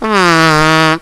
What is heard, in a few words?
fart